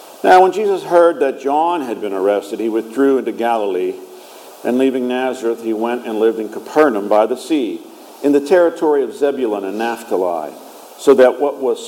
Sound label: Gospel